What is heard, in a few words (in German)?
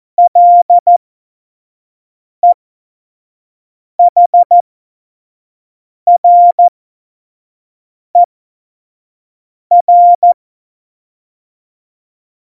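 Witz, cw morsen; Morsekurs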